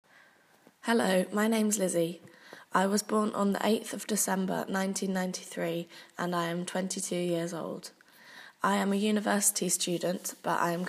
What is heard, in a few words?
irish competition